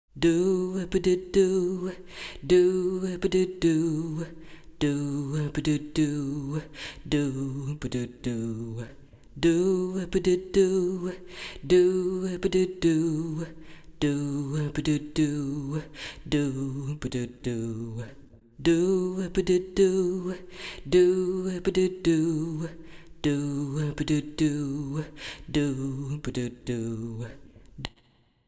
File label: basse